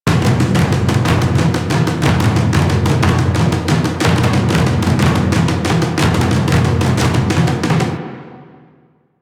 Loot Run Cue Loop, Music; Warframe; Orokin

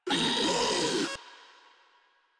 Infested Ancient Player Spotted; Warframe; Proto Infested

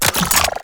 Reload; Warframe; Weapons; Acceltra Prime

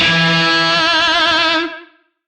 Shawzin Oct Two E; Music; DE; Warframe; Lizzie Shawzin

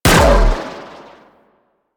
Briefcase Akimbo Pistol Shot; Warframe; Weapons; Corpus

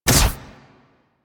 Drill Flame Burst

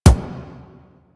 Weapon Punch Pistol; Warframe; Weapons; Pistols

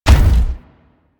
Step; Warframe; Corpus; Jackal Redux

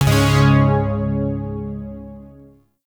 Shawzin Chromatic Chord B; Zariman Shawzin

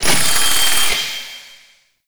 Key Pieces Ruins Cache Open; Warframe; Infested Eris; Encounters

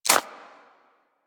Bird Of Prey Step Dirt; Warframe; Footsteps

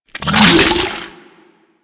Tendrils End Burst; Warframe; Warframes

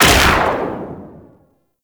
TnT Shot; DE; Warframe; Weapons; Archwing; Powers

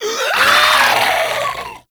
Infested Runner Explosion Warning

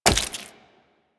Wall Jump Flesh; Footsteps; Warframe; Tenno; Wall Run

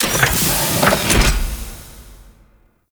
Ship Airlock Door Open; Warframe; Doors; Corpus

